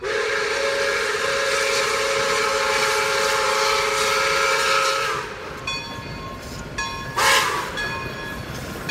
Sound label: train whistle